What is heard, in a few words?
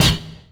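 Bleuy Oneshot, beats; Sonic Foundry (Sony Creative Software); Esoterik Beatz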